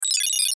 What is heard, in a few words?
button digital bleeps